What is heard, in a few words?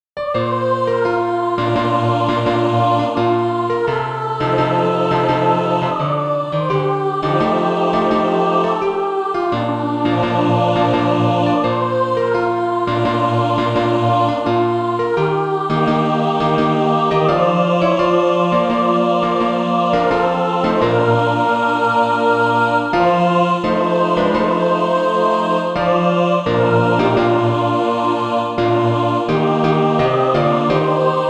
mixed, SATB, Practise Music